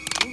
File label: m4 no ammo